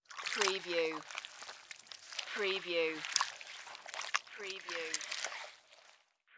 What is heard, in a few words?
Mud Movement Wade